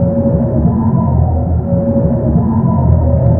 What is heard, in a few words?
Stone Idle; Seer; GamigoNA